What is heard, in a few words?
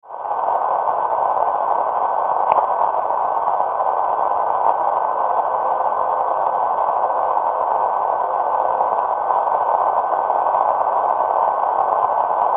NDB, 332 KHz; Padova, Italia